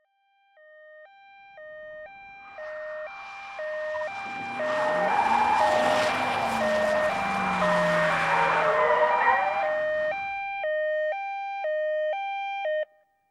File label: siren approaching; mystery-island